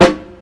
original steel snare drum sample f key, Royality free snare sound tuned to the F note.